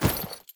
Temple Generic Footstep; Warframe; Foley

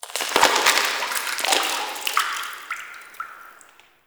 Interior Drip Goop Run, Infested, Ambience; Misc Indoor

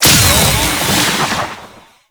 Strike Cast; Warframe; Captain Vor; Abilities Redux

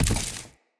Walk Vegetation, Footsteps; Enemy; Characters; Lady Deora

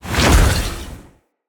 Staff Caster Cast Short; Weapons; Staves; Dendrit Staff Caster

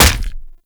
Punch Impact Flesh; Warframe; Infested; Kick Punch

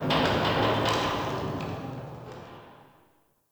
Underground Metal Creak; Ambience; Warframe; Nokko Colony

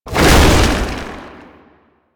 Tank Infested Melee Swing; Lasrian Tank; Abilities

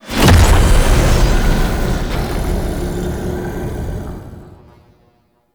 Alchemy Fully Filled Stinger; Entrati

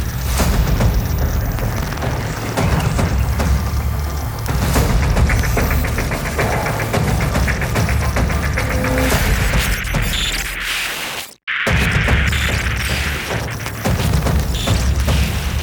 Purgatory Video Feed; Protea Quest M2

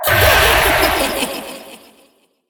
Girl Death B; Warframe; Zariman; Skitter Girl

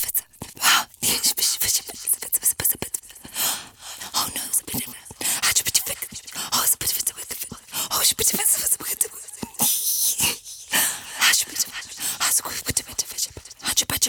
Girl Idle Loop; Warframe; Zariman; Skitter Girl

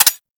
Uzi Mech; Tenno

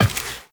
Step Glass, Footsteps, Walk; Warframe; Tenno

